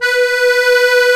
DIATONISC, AKKORDEON

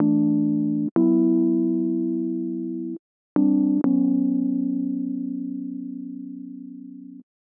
125 Rhodes Dom E, Keys, Nu Jazz House

